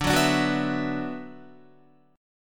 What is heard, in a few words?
D 6th Add 9th, Guitar; strummed; 4E,4A,5D,5G,5C,6F; chord